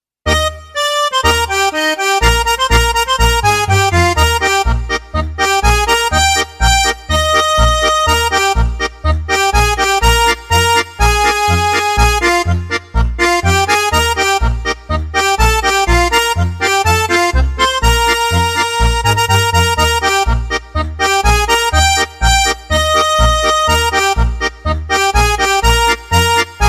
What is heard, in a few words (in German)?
akkordeon